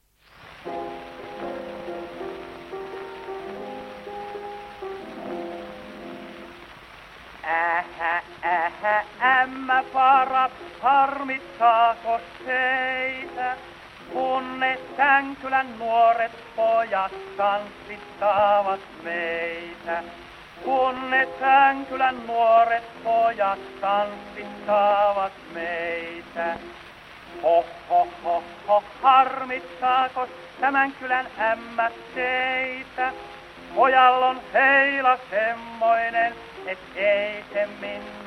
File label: Gramophone